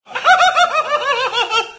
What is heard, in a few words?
crazy laugh; Source Engine